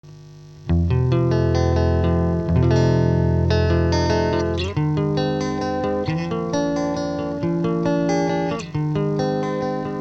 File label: Fender